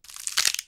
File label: bone break; Paradise